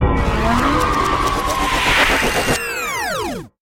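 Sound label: Production Element Sweeper; Sound Effects Bible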